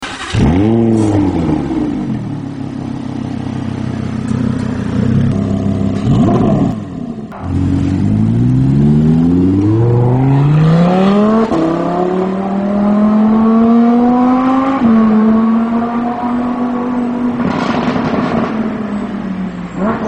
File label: Lamborghini Huracan Spyder